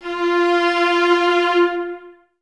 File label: String Ensembles, Violas